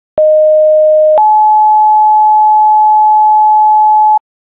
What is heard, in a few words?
siren; clinton